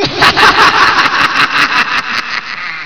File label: witch laugh